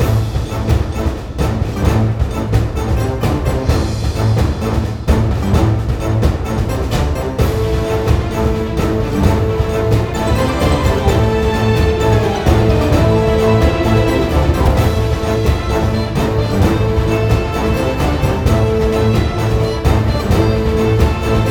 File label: Ingame PVP Hurry Up